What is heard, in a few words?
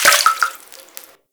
Hut Interior Drinking Well Drips; Midrath Island